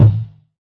floppy bounce kick

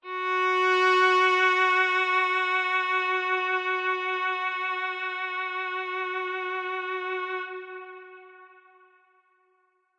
Cello Verb F sharp 3, Verby Solo Cello

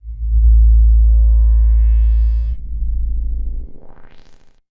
102 abstract 2 tone sweep bass

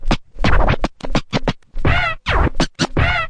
turntable HIT, Scratching and Turntablism; DRUM LOOPS and BREAKS

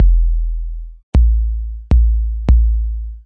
stompin subbass

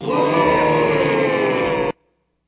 yay groan; MALE MISC and SPOKEN WORD

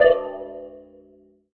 space blurp; Weird and Interesting Hits; single hits

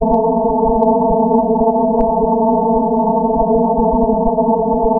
ambience; Scars FNC SFX

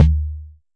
Jomox MBrane, drum machines; Jomox MBrane 11

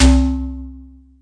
Perc Pitch Drum, Roland TD 7; DRUM MACHINES

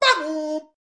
bebop ba goo, male singing

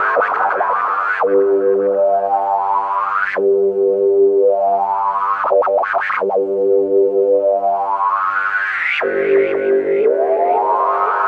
tuning the knobs, Synth

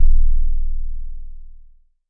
Jomox MBase, drum machine; Jomox MBase 01

